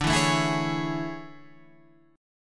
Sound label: A Major 7th D